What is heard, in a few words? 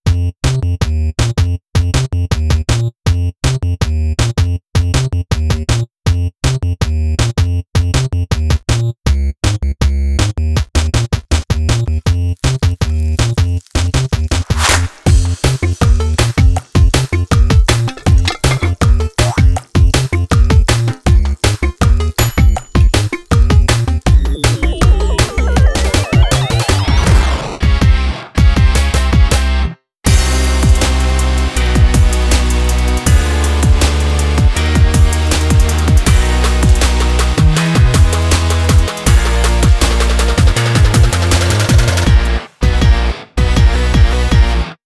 no Vocal